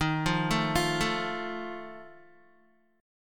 Eb Suspended 2nd Flat 5th Eb Guitar 4F 4A 5C 5G 5C 6E; Ebsus2b5 arpeggiated; JGuitar